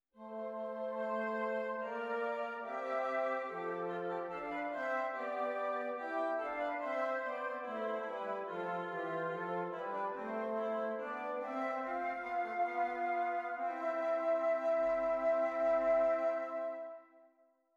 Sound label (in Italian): esercizi di doppio contrappunto nella decima; Ronge pagina 169